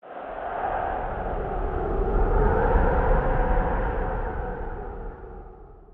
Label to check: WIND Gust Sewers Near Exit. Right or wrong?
Right